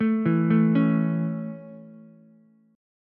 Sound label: Db Minor Sharp 5th Db Ukulele; arpeggiated; Dbm#5; JGuitar